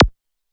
Akai Zap

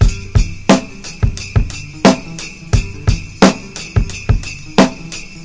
Sampled Beat

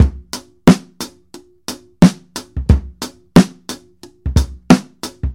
OffBeat Loops

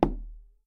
Low Organic Kick

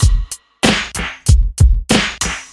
Hip Hop Rap Beat

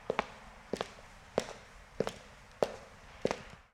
Hard Soled Shoes

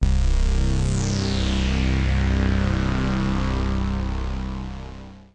Fat Bass Sound

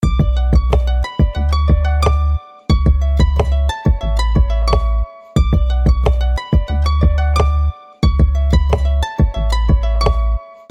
Hip Hop Angel

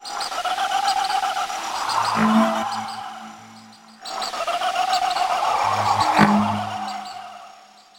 Melody 140bpm

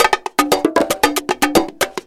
Latin Tribal Beat

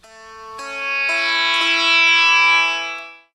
Majorish Swar

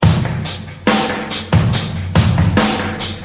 Drums

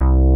Latin Bassline

